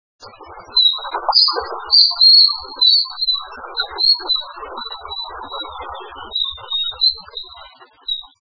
kawasemi

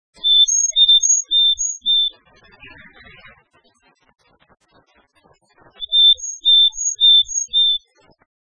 kogara

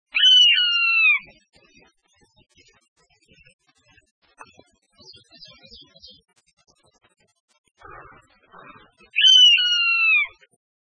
nosuri

